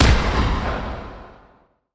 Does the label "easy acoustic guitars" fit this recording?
No